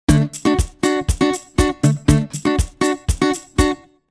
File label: guitar